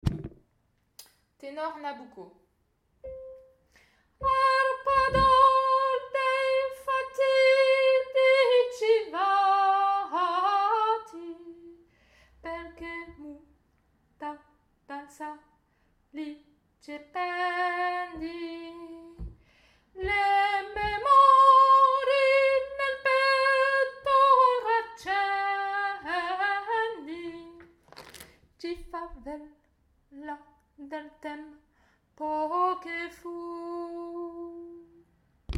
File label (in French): tenors nabucco